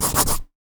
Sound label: Pencil, household rhythm samples Hits